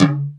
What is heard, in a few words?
Toms